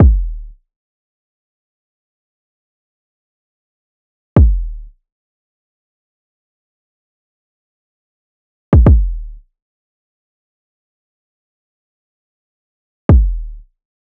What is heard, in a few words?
kick 110, Kick Loops; drum sample pack; Azufre; SEISMIC; Slate